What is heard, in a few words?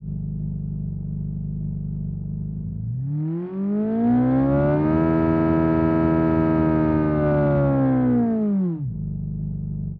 v8 italian, engine sound